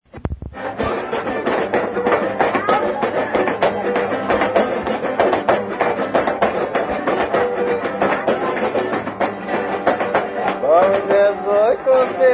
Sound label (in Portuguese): Danças, Dança de São Gonçalo, Mogi das Cruzes SP